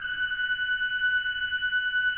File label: F5, Cathedral Organ, HOLZGEDAKT; Propeller Island